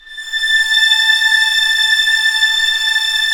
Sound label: STR, Vls f Orch; Roland L-CD702; Vlns 7 Orch